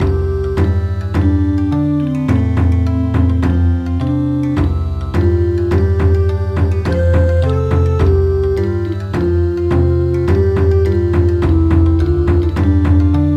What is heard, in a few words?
Bon Odori